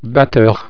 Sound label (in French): batteur